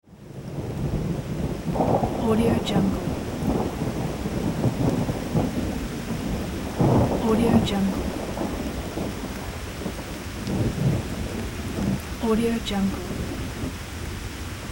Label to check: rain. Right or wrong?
Right